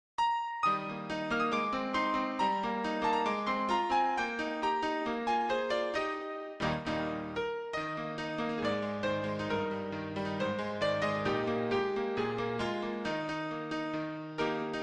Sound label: X8 Blue Bell Ring